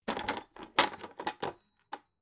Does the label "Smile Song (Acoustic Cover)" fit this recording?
No